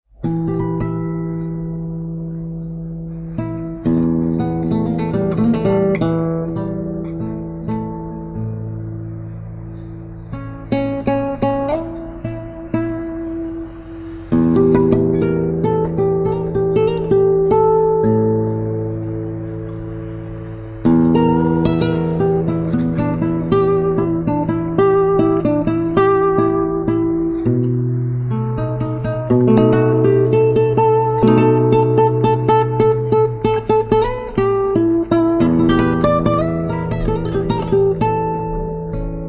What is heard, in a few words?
blues, guitar